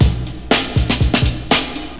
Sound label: Classic, Breaks